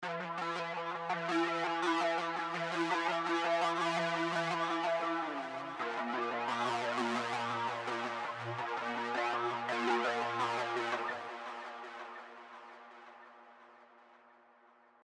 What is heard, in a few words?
oxotremorine guitar sound